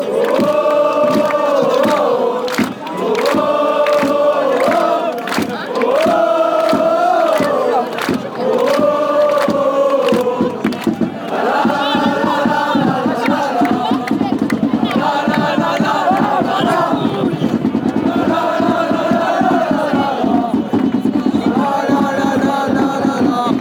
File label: dale, chants; cavese di bastia